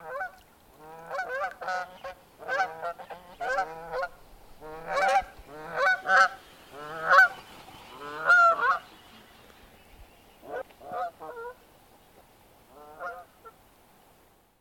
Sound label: Geese flypast